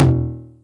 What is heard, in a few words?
Toms Electro